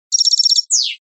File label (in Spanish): timbrada; campero